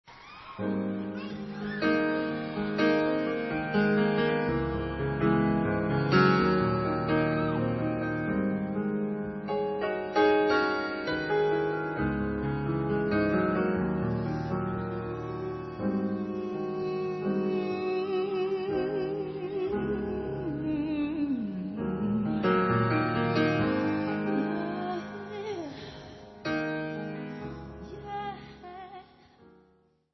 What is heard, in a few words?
unplugged version